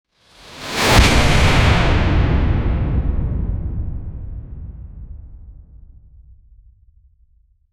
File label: fast dash with sharp air, metallic whoosh